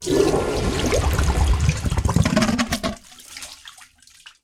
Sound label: Toilet Flush Clogs; SFX Kit; Foley - Misc